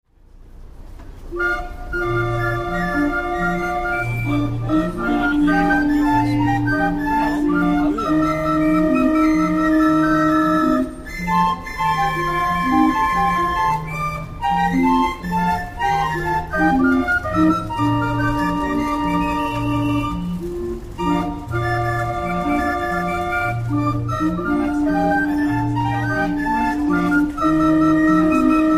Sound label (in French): orgue de barbarie